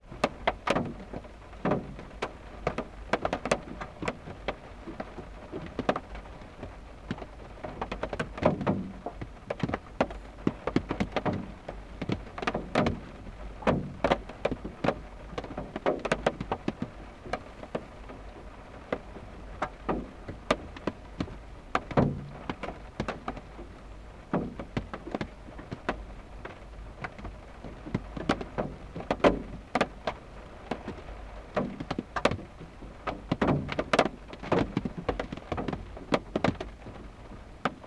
nature rain drips on car roof and windshield parked engine off forest car park